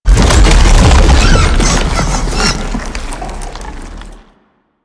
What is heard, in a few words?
ape prelate escaping loop; EndlessCapture